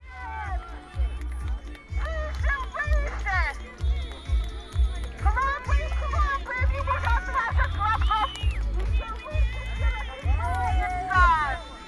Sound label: maslenitsa, sergiyev posad